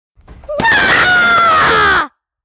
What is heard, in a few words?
scream funky